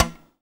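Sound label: TZRS PIZ E 2, tzouras pizzicato; Soundscan vol.53; Mediteranean Traditions; AKAI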